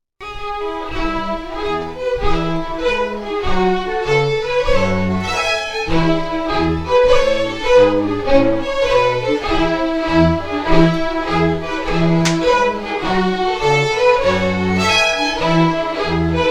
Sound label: Strathspey